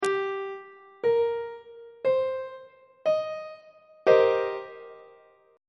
c minor 7th 2nd inversion on treble clef

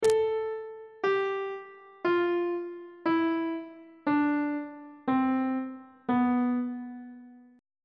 c flat locrian mode descending; on treble clef

